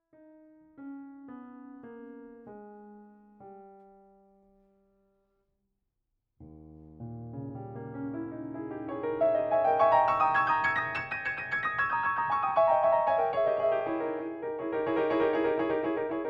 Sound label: pitch shift samples; Avid Pro Tools; PitchⅡ